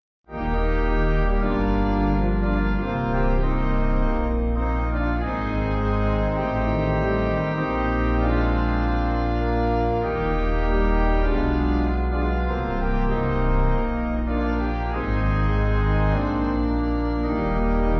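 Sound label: Pipe, Pipe Organ backing; Deep in my heart there's a song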